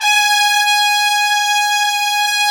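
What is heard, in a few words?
trumpets section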